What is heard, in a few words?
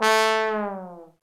TROM LNG FALL